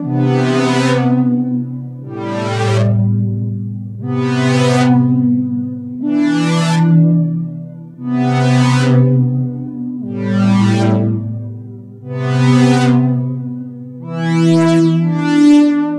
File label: synth pad dry no airspace; airspace hybrid reverb and delay; modeaudio